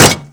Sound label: physics knife painted metal